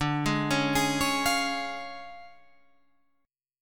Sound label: D Minor Major 7th Flat 5th, D, Guitar Drop D